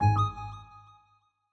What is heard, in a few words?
Exclamation, sound scheme; Longhorn 7ista Sound Scheme by David Mounder